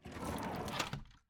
close wood, doors sliding; dragonroll

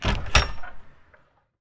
open heavy, doors wood; dragonroll

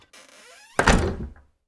close creaky, doors wood; dragonroll

